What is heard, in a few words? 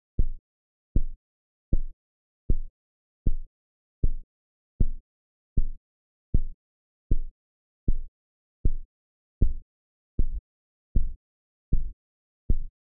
pulses